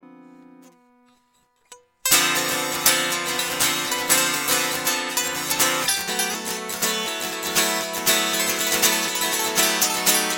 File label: Travel Guitars Piezo